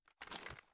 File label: inspect, mp7